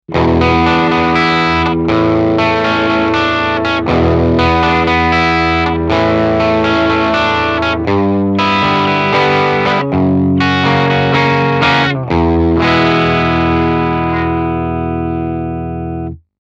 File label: Rhythm Guitar; GR2 Workshop